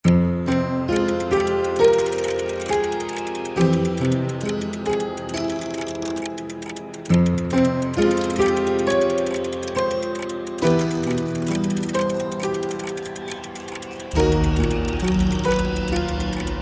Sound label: Piano retro; Tajna spiasiej damy OST